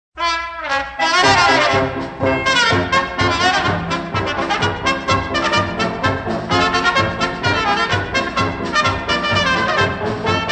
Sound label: POLKA